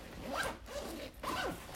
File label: backpack zipper; Record some "everyday" sounds, process/transform/arrange them, creating a composition